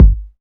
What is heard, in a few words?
good kickdrum sound g sharp key; Mono; Bitdepth 24